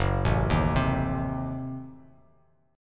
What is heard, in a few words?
Gb Diminished 7th, down, Bass Standard; arpeggiated; JGuitar